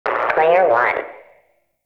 Arcade Voices; Ambience; Props; Warframe; Nineteen Ninety Nine

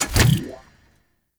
Hydraulus Enter; Warframe; Bard Quest; Bard Quest Music Hydraulus

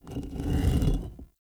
Shrine Puzzle Stag Turn; Doors

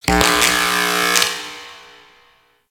Corpus Infested Light Flicker; Warframe; Ambience

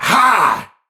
Pain Heavy; Tau; Dax; Battle Barks

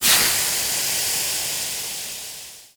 Bridge Steam Release; Liset

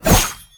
Kunai Whoosh Sweetener; Weapons

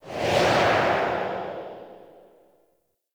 Travel Whoosh Left; Reaper; Warframe; Wraith

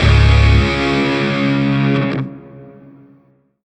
Shawzin Phrygian Chord E; Music; Warframe; Grineer; Chords

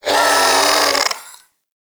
Vocal Pain; Warframe; Duviri; Shadow

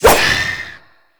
Void Dagger Whoosh, melee weapons; Warframe; Zariman Dagger; Void Mode

